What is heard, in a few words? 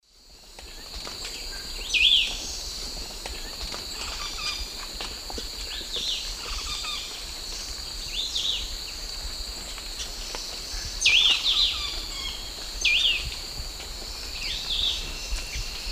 Hylophilus hypoxanthus, song and calls; S. America; Eastern Lowlands